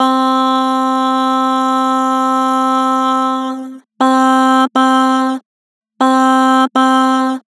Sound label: "Bah", Vocals; Harmor